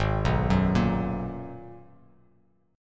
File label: Ab Minor 9th down Bass Standard; arpeggiated; JGuitar